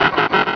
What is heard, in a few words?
cry; source of the sovereign